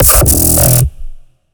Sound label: growl in e, bass